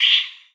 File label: hiss